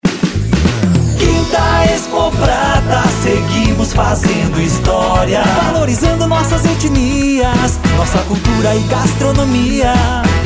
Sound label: jingle